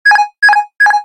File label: autopilot, aircraft; combat; CosmicRage